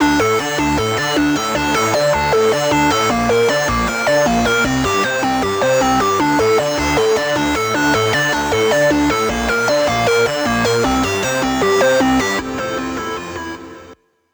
lead loop Dmin 155; Music Loops; GhostHack

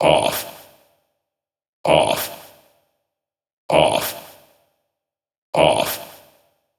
Vocal Loop 130, Techno

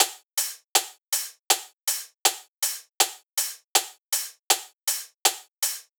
Industrial 160 Closed Hat; Drums; Loops; Drum Construction Kits; Ghosthack; Drum Mega Bundle; Drum Hero 5

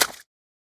Short foley

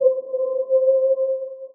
LEAD (C), Pedal One Shots; Twist; Cymatics; ANALOG EVOLUTION Beta

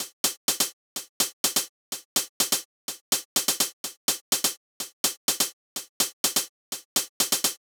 Alternate Drum Loop 125 BPM Hihat, Analog; Drum Loops; Cymatics - ANALOG EVOLUTION Beta

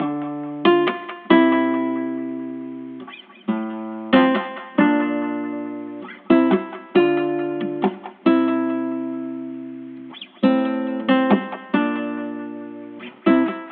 138 BPM D Min, Guitar Loops; Souvenirs; Cymatics; Journey Guitar Loops

